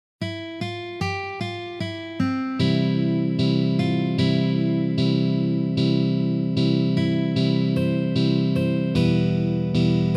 Verse Slow Tempo, guitar

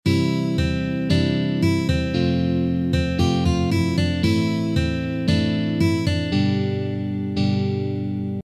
Intro Slow Tempo

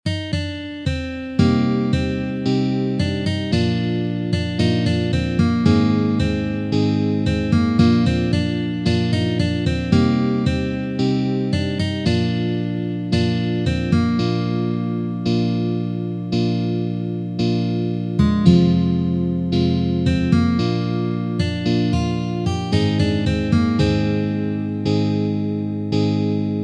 Chorus Slow Tempo

